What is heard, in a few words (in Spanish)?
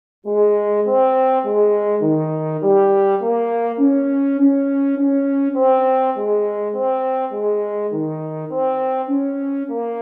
sonatina bemol mayor para duo trompas